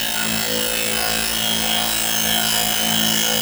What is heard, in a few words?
TECHNO EXTRA 140